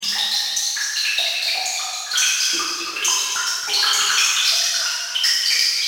SpaceDrone background

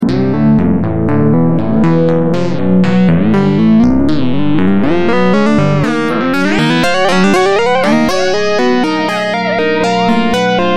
Resonator

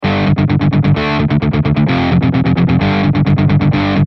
Guitar with Player

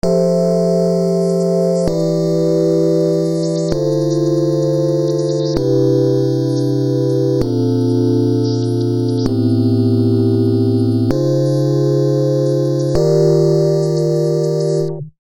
pad

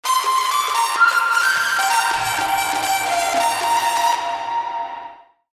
riff with fx

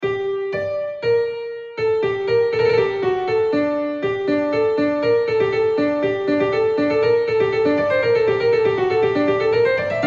Keyzone Piano

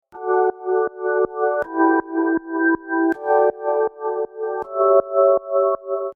micro prism pad gate

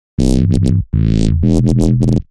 LAZER BASS

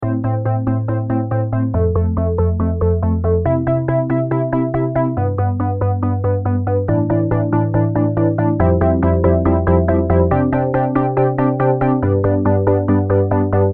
pad

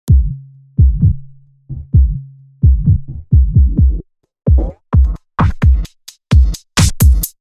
drum with fx

